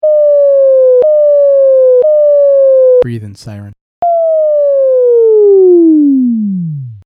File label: Straw Exercise F2 to F5